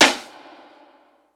snare; dough-samples; eddyflux

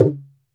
gumki, mrid

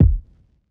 kick; eddyflux; crate bd; dough-samples

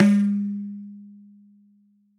dhum, mrid

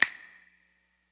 snap reflections; eddyflux

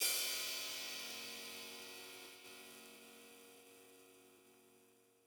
ride; eddyflux

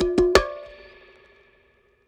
conga; eddyflux; dough-samples

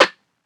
snare; dough-samples; eddyflux

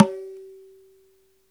dhin, mrid

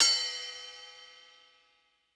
ride; eddyflux

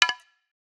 perc; eddyflux

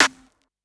snare; dough-samples; eddyflux

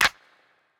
clap; eddyflux; dough-samples

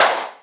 DncClp, BossDR660 fx; drum machine

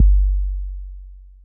808 BD, MPC1000; drum machine

